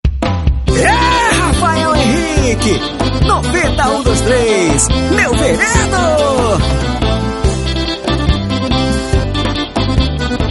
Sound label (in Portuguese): Jingle politico